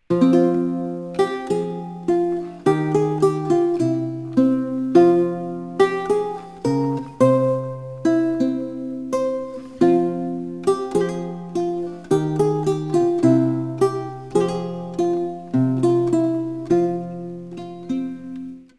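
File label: LUTE DANCE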